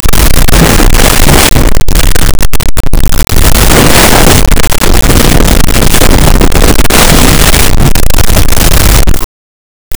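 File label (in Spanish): sábanas de cama moviéndose; bed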